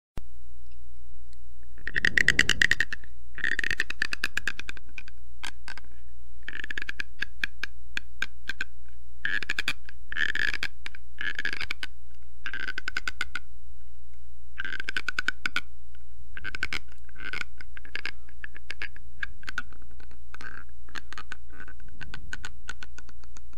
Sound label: teeth grinding sound effect